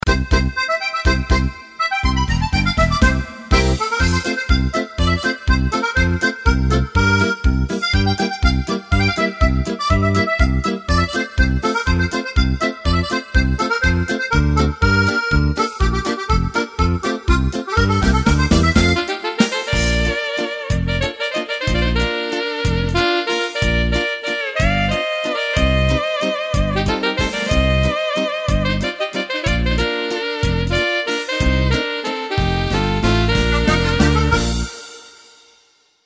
Italian Folk

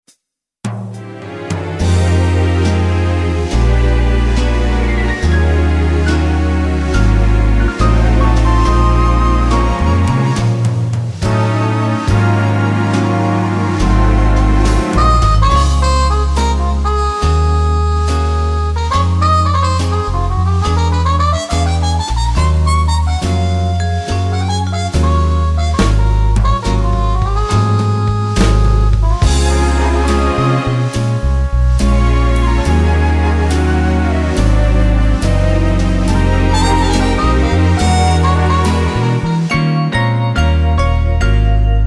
Slow Swing

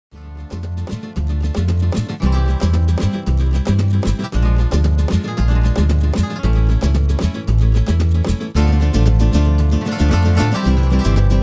Rumba Guitars

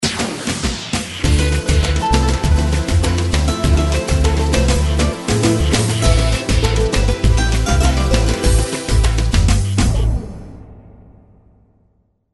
Latin Etno

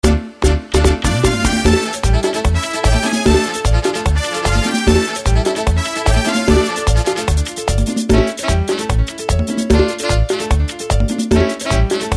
Merengue

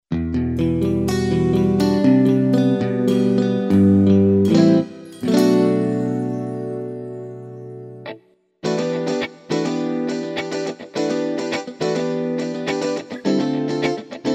electric guitar

